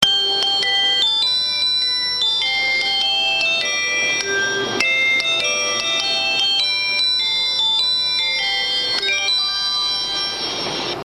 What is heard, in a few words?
train chime; こうのとり; チャイム２は３８１系